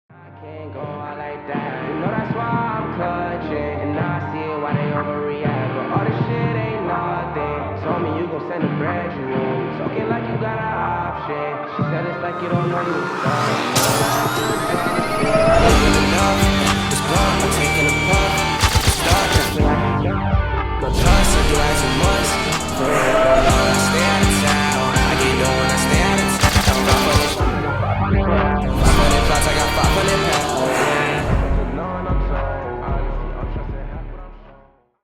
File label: guitar remix